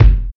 studio steel kick drum sound F sharp key, kick drum single hit